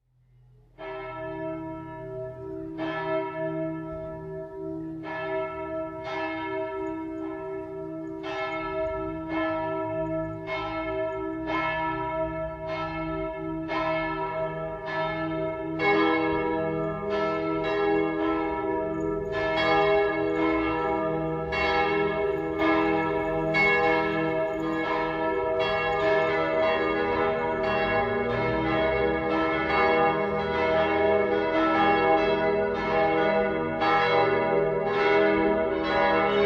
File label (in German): Teilgelaeut auf gis, Domglocken; Salve Regina